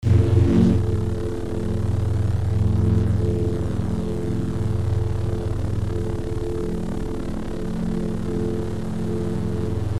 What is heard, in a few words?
Double Bladed Saber Sound; FXHome